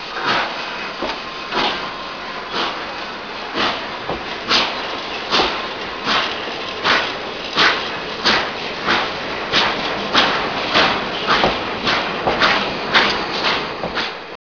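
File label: locomotive; 8bit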